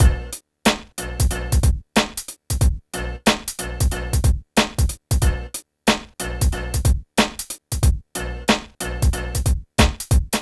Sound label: PHATT BEA